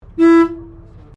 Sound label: Bus Double Decker Bristol VR Interior Horn; Bristol Vr 1971; Bellarine Tourist railway vehicles; Busses